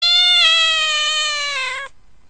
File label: gercek kedi sesi cat meow sound; gercek kedi sesi kedinizi cildirtin